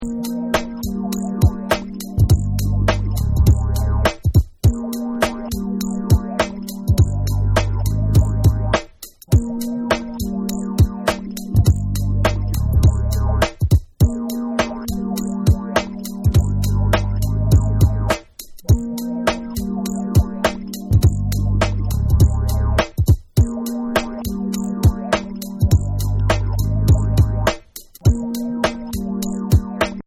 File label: Instrumental